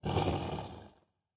panther fx low; serengeti kings

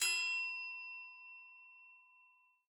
multiplier Present End; cashomatic

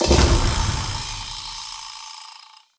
Start Free Spin Button Press; aloha

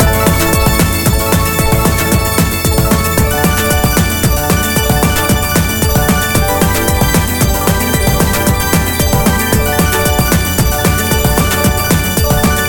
big win loop; silverback

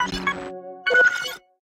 bet Level 2; hotline2

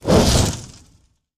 fs Hit Heavy Volcano Add; wild worlds

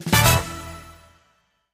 small win; king of slots

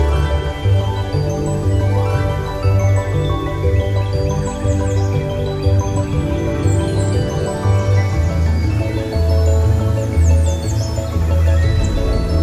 ambience Bonus Game; fairyred

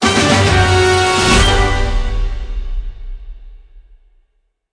free spin lose; football

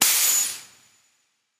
steam Short; eggomatic

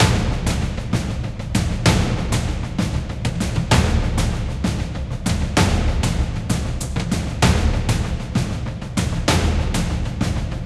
super Mega Win Loop; temple of nudges

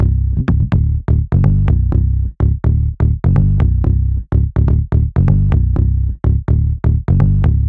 music loop main bass; disco danny

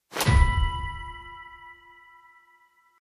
transform Blink; finn

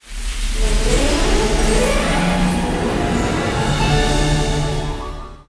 random Wild Start; fairyhansel

